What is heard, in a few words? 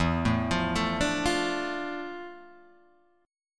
D Minor E, chord; Dm/E arpeggiated; JGuitar